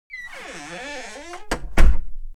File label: creaky closing wood door